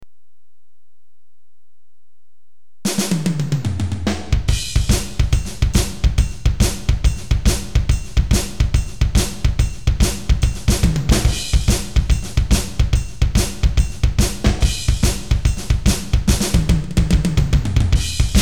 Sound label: Drums